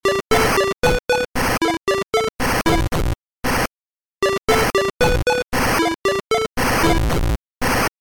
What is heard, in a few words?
drums